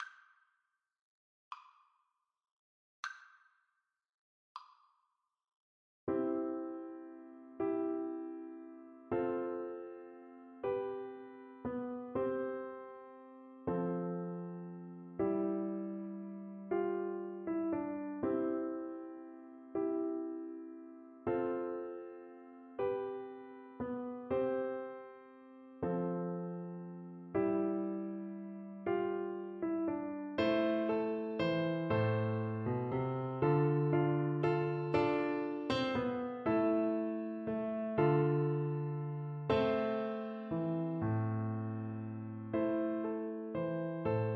skye boat, XYL; Percussion

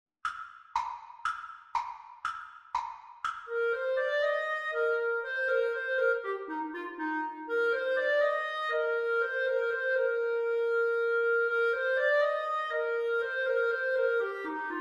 baltimore belle, Clarinet-Saxophone Duet

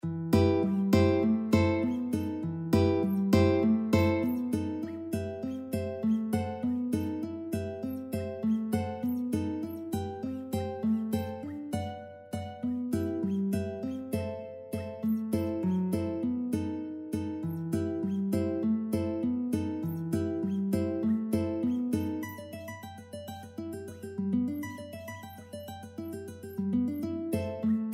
estudio, guitar; ponderoso III

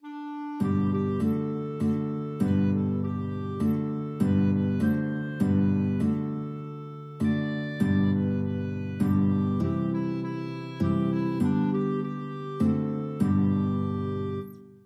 clarinet guitar

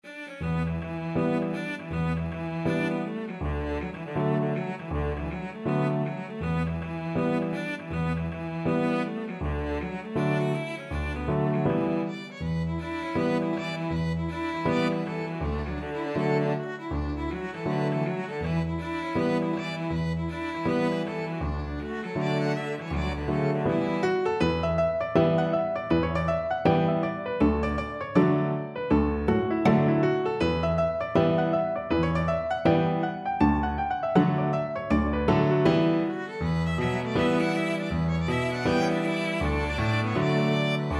pigeon roof on, piano trio

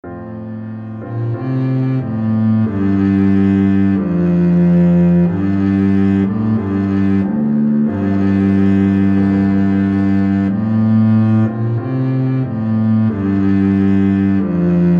double bass

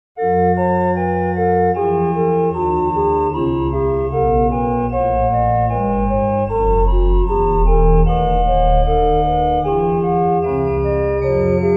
organ

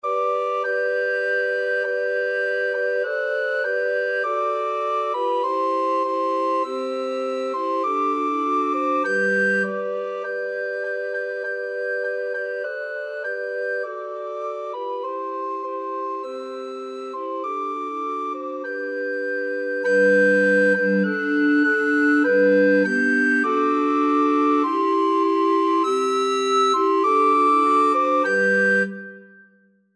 boars head, recorder quartet

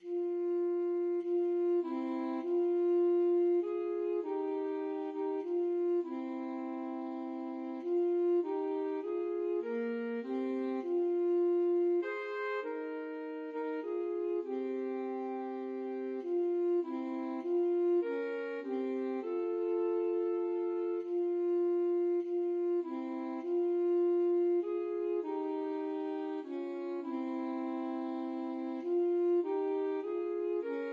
carol, alto saxophone duet

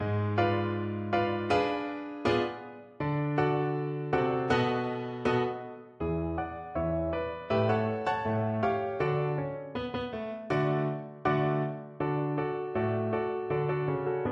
violin

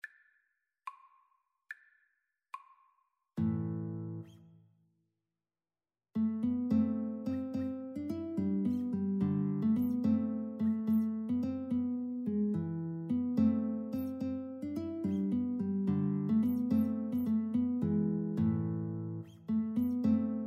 Guitar Duet

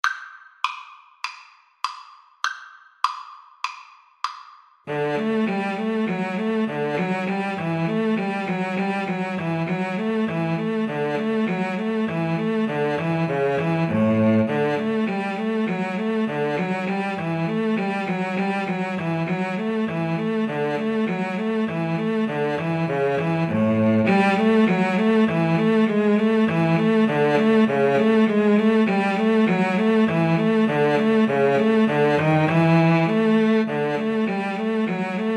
schumann melody, Viola Cello Duet

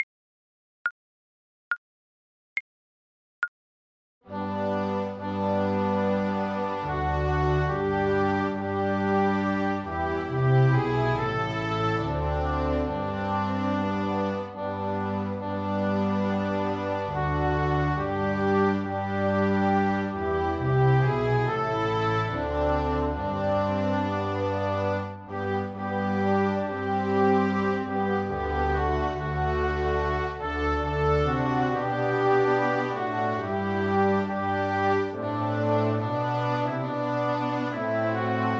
christmas tree piano

